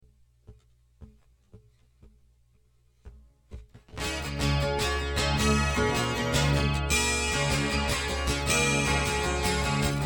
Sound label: elecacoustic